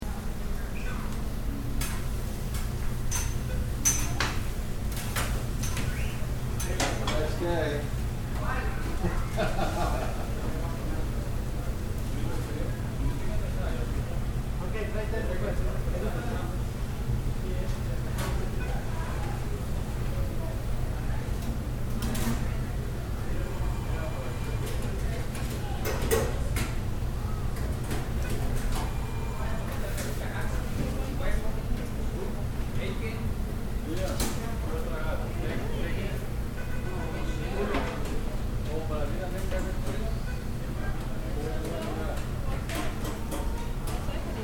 Cafe Background Grills Register Phone Small Crowd Walla; Restaurant Sound Effects; Urban Spaces